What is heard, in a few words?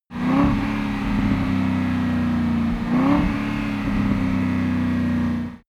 car aston martin deceleration short rev